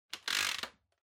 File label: Vacuum Cleaner Pipe Shorten; 掃除機03-35(パイプ たたむ)